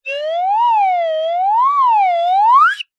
cartoon slide whistle up

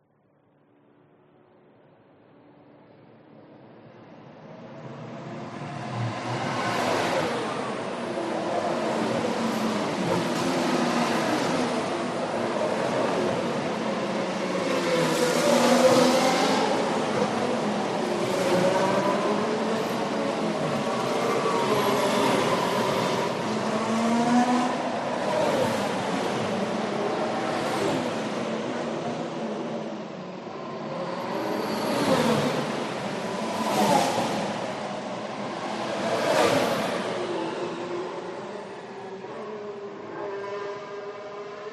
f1 race track pass speed

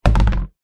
plastic washing basket tub down on wooden floor; foley

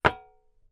industrial paint pot metal down on surface

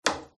toilet bowl

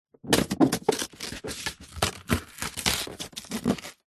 foley cardboard packaging rip tear open; zapsplat

